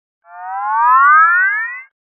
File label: Comic Slide Long Going Up; Hollywood Edge; sound designer's toolkit v2